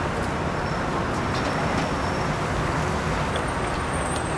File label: s town; Mafie 1